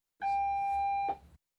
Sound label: Ambulance Service R T Selcall Ble; Emergency; BBC Sound Effects Library